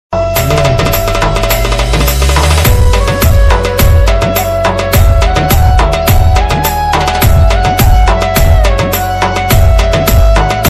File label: mata rani ringtone bhakti ringtone maa durga ringtone; duga mata